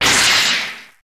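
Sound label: Electric Crack Zap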